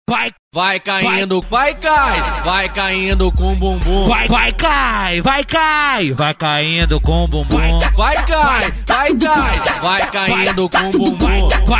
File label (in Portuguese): eletro funk; cd na batida do eletro funk